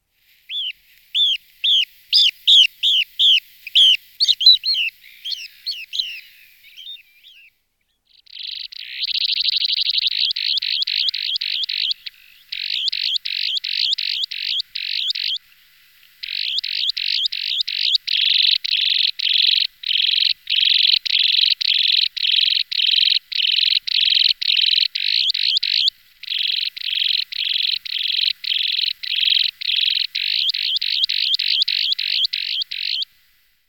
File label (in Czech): Jespacek ploskozoby Limicola falcinellus